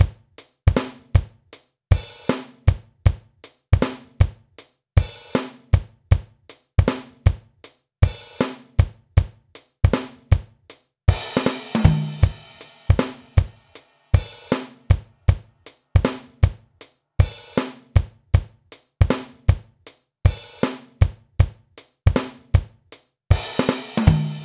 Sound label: Bouncy